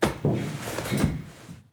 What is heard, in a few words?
Player UI; Five Nights At Akers; soma